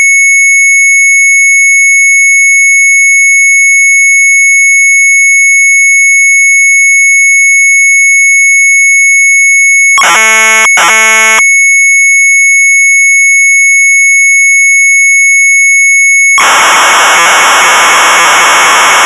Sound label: MZ800 Tape, sharp; PAARDENRACE; retromelsarchive